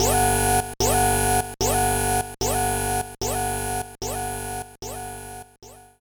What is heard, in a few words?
Pile Driver Activated